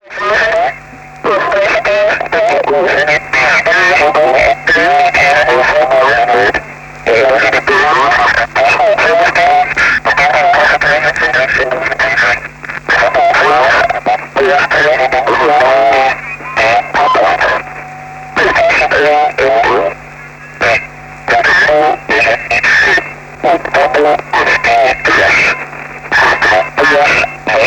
geheime telefonie

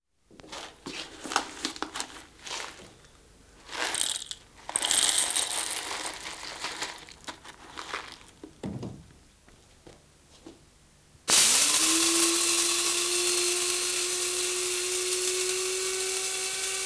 koffiemolen elektrisch

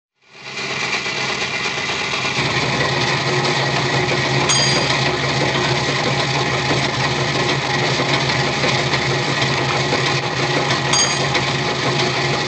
oude benzinepomp bel

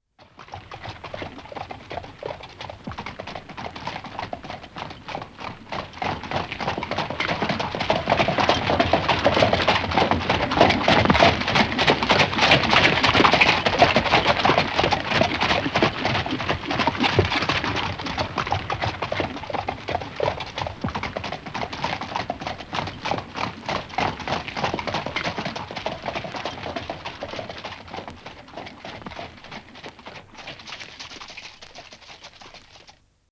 paardenwagen

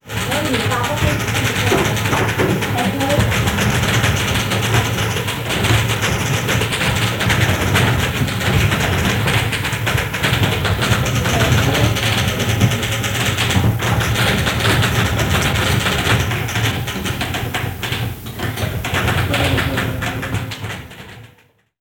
typkamer praten

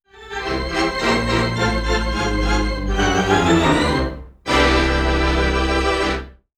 hammond in bioscoops

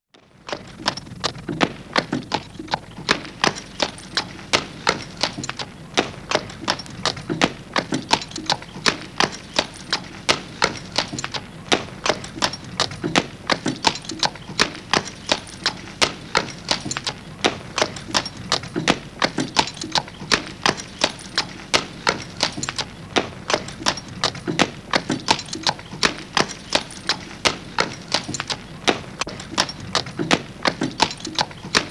paard voor kar

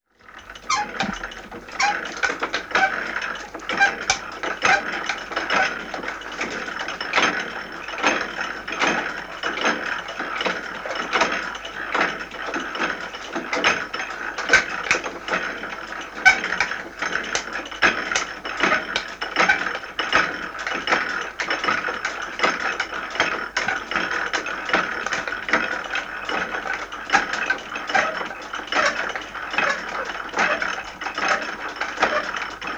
handboor smid

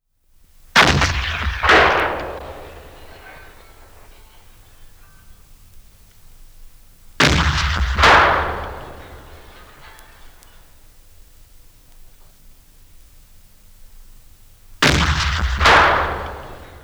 kanon brons

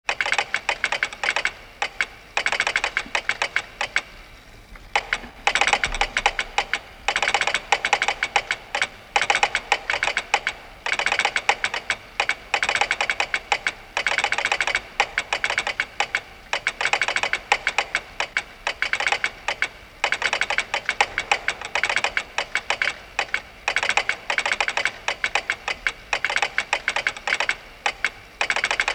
seinsleutel morsetelegraaf

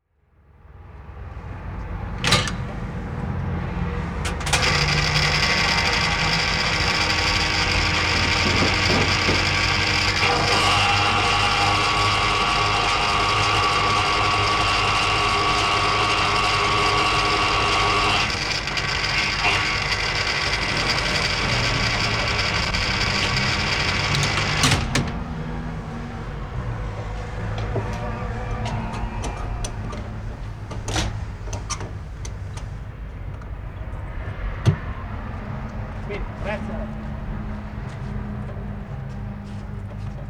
oude benzinepomp autos

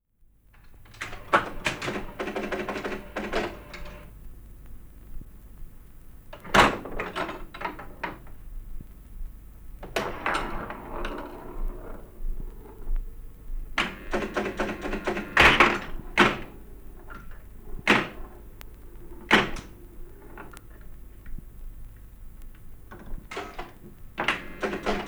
flipperkast mechanisch

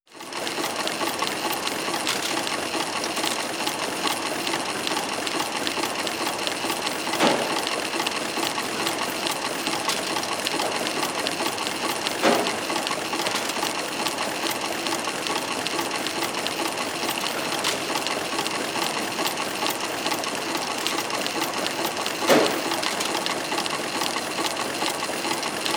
garenspinnen

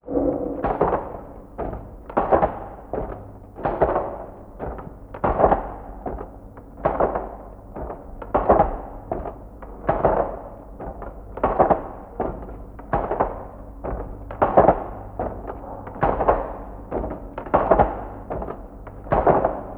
handweefgetouw

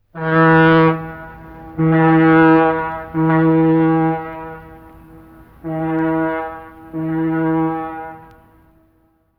scheepshoorn lijndienst

